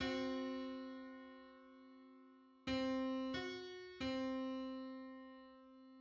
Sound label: Three hundred fifty seventh harmonic on C